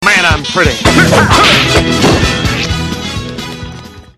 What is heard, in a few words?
Sms; Johny Bravo